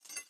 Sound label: Maze Retro Game Chain Pull